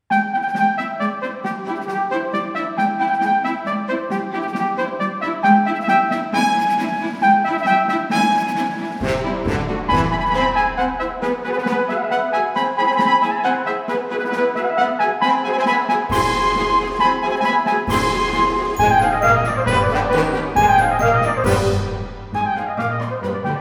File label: trumpet upbeat